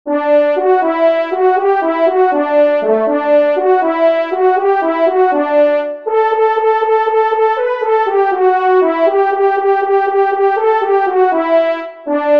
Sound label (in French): Solo, trompes; Chevreuil de bourgogne